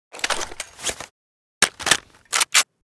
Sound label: sg552 reload; STALZONE